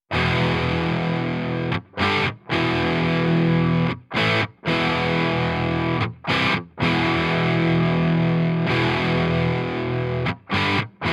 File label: Classic Rock 2 LP Hex